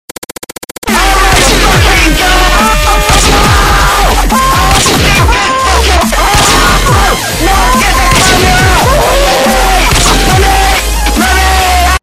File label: follower alert audio test